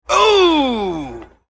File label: ooh